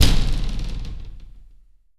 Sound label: SHOWER SHAKE, percussive